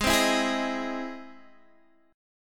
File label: E Augmented Major 7th